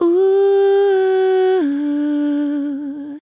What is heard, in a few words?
Ooh